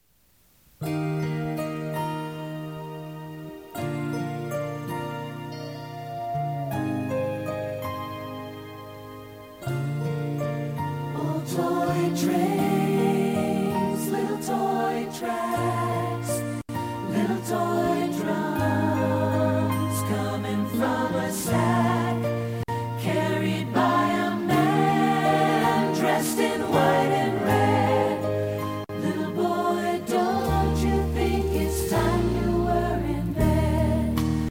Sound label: vocals flute horns; Horns, Flute, and Vocals; Rivercrest Instrumental Music